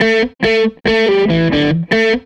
Les Guitar Loop

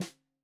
Snare 65 Reg Stereo, Deeply Sampled Drums

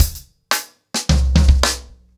110BPM, ACOUSTIC DRUMS

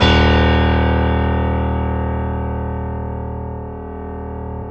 PIA GRDPI, Roland; Sound & Vision; Gigapack I CD 2; PIA Grand 5

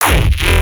Bass Shot; neuro; BIG5AUDIO

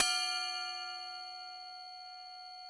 ORIENT F4, ORIENTAL; Music Store; Yamaha VP-1

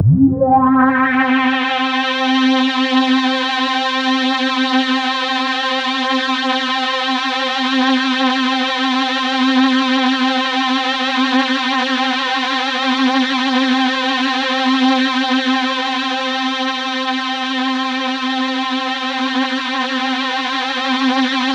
C3, Synth Line; 90 s Sample CDs; NiceBeats; SynthLine VOL-18

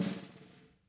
SNAR, Interactive Drum Kits; Sonic Reality; ID SNARE 1+

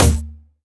Percussions

